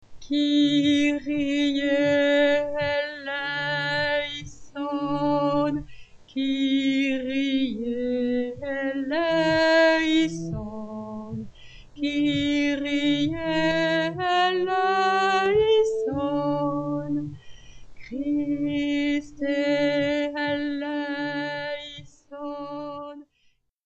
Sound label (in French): Kyrie Basse